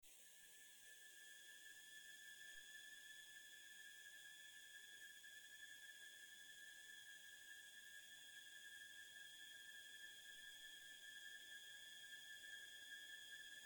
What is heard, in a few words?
Tension Intention Detective Story